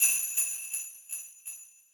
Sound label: soft hitwhistle echo